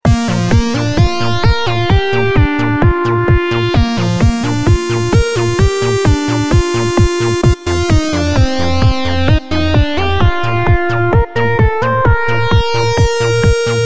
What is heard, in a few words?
funny kids music